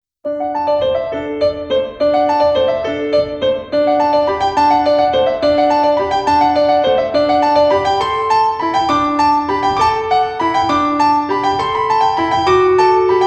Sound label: piano solo; 6. sats fra Syv slaatter og impresjoner for piano solo